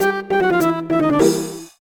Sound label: Jingle Level Fail; Nicktoons Unite; DS